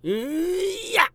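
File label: Mon Man ATTACK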